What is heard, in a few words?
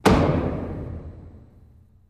Gavel Beefy Slow; gavels